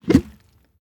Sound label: designed whoosh whoosh gooey dull airy pop; transitions and movement